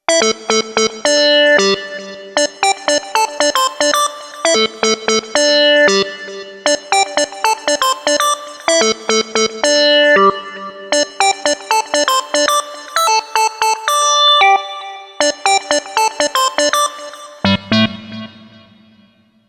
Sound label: LEAD; SupaJuce1